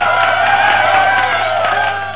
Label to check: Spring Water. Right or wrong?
Wrong